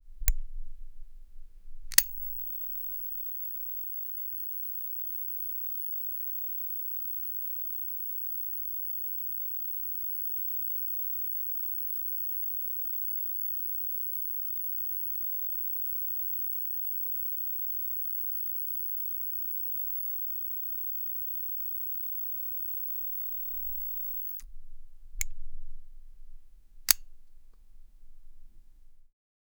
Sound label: tungsten light lamp